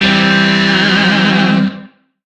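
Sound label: Shawzin Pentatonic Major Chord; Warframe; Lizzie Shawzin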